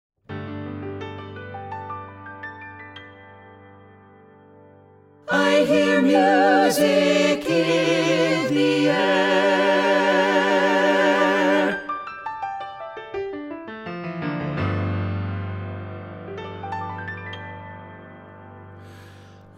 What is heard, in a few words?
SATB